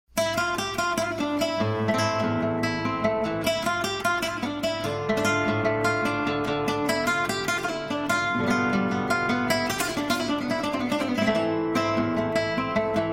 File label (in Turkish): Harika Gitar